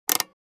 Rifle optic adjustment; assault rifle

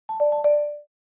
Notification alert

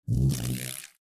Wasp wings passing by fast

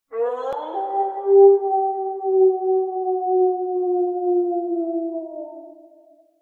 Wolf howling strong and wavering; animal

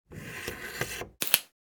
Military radar antenna extending; military equipment sound effects

